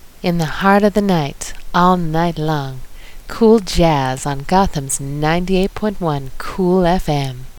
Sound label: station break